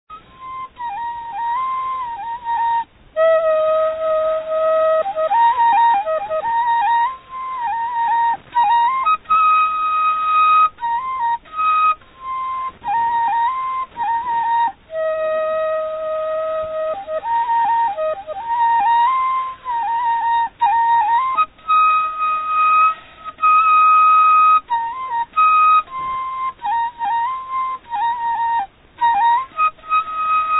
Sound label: Lao Instrumental Music; Vientiane/2000; Lao Khen Solo: Lotfay Taylang